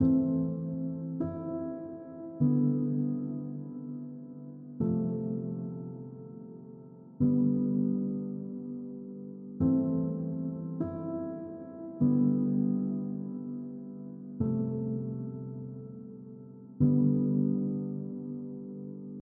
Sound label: Dreams Piano